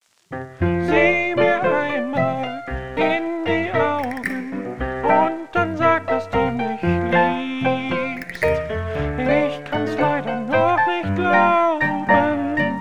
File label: tango